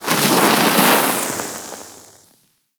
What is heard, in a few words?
Fire Swing, Hammer; Warframe; Grineer Hammer Boss